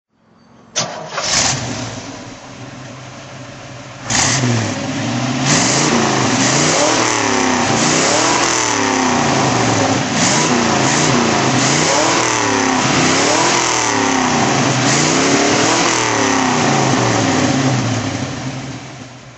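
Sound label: corvette red throttle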